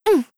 jump

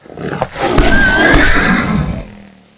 bear vs horse; ambience

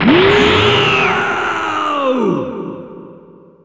scream

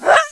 Female jab

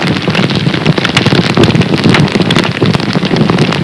amb torch old

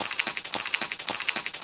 Critter Scurry

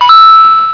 coin

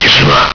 silence

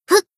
jump; azusa

